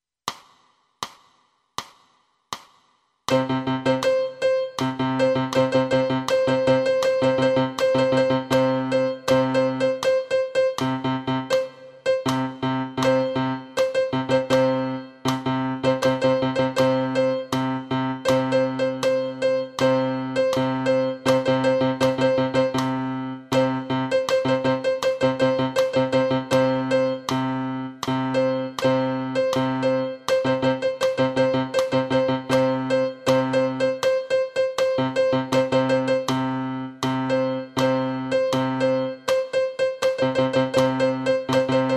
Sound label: piano